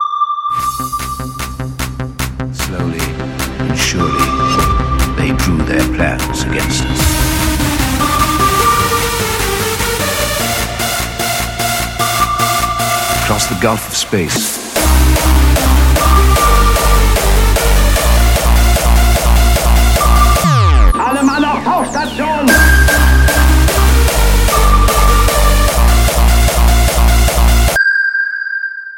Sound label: Techno sonar